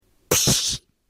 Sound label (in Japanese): ツッコミを入れる